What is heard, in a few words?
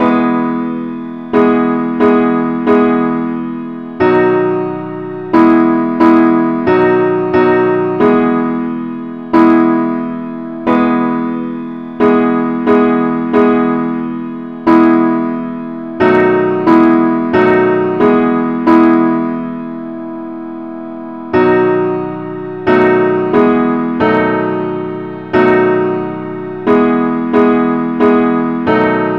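simple accompaniment key E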